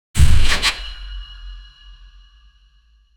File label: single solid metallic footstep